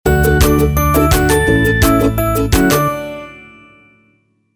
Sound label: Happy UpBeat E Logo Version